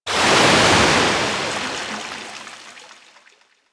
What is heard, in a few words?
Ocean Wave Crash Large; Warframe; Grineer Ocean